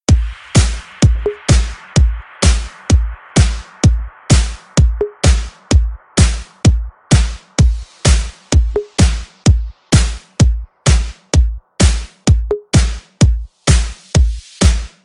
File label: techno beat